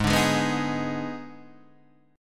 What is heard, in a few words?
Eb Major 7th Suspended 4th Sharp 5th Ab